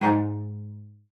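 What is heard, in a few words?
CELLO spiccato G#2; Xsample VOL-5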